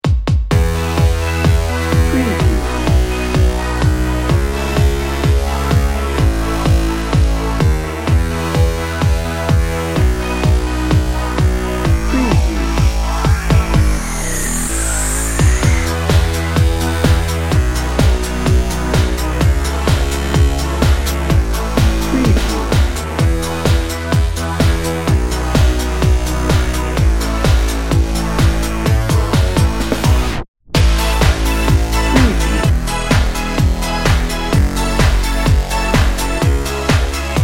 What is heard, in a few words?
joyful edm bed